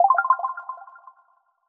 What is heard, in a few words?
Correct